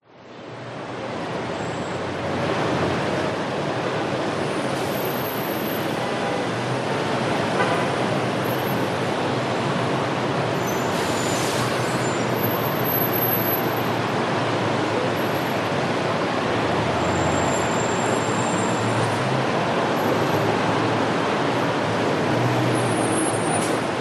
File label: USA LAX Airport Parking Garage Traffic for Terminal mono